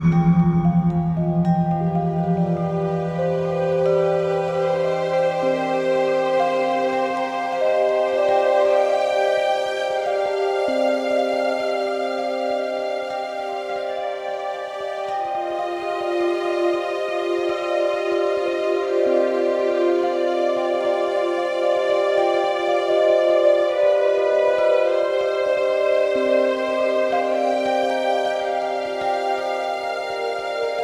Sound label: Bell Tower 52bpm; EXPLORATION LOOP; SAGA Complete Collection v2.0; SAGA II - Emotional Exploration